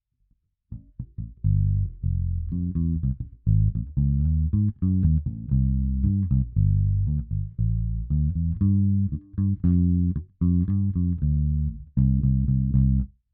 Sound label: Bass